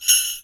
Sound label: cascabel